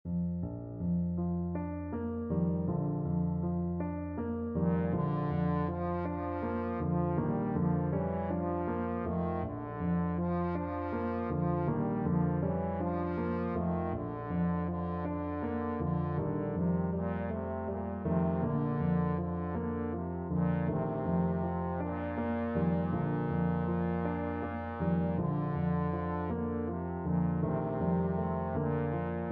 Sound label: lullaby, trombone